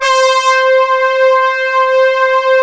blower C4; Best Service Alpha Dance vol.2; ATTACK

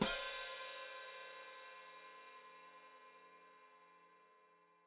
Hat Closed Open, Hi-Hat

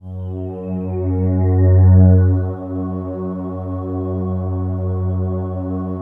COSMIC, PADS; Masterbits; Vintage Voltage

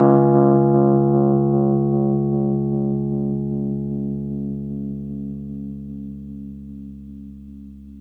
Rhodes 73 Slow, C#; E-MU Producer Series Vol. 5 – 3-D Audio Collection; 3DRhodes

